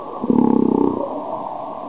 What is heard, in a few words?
growl